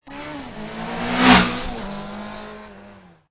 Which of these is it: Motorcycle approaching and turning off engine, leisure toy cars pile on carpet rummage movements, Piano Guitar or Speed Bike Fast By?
Speed Bike Fast By